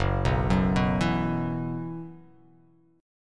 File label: F sharp 7th Suspended 4th, guitar; F#7sus4 arpeggiated